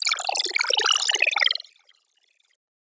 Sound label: SFX Robowater; One-Shots; Ghosthack; Color Bass Essentials